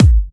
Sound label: Hard Kicks; Vengeance Samples